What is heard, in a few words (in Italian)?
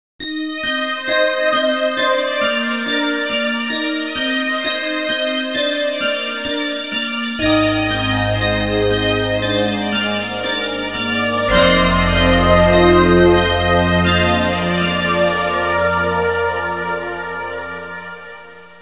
audio suonerie musica mistica